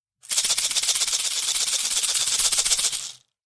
shaking salt

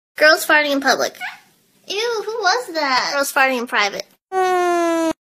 girls farting in public

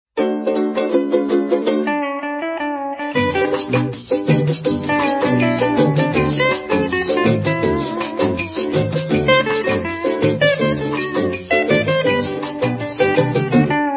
rhumba

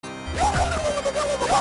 bicycle kick; mortal kombat; liu kang

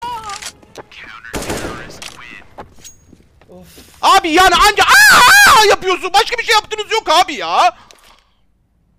aghh aghhh

